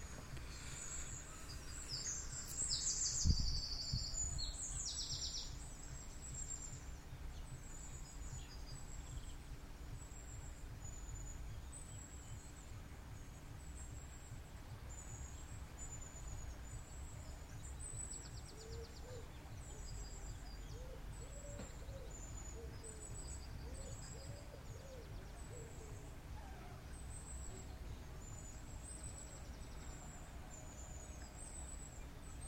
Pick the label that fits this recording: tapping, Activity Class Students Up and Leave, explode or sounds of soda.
sounds of soda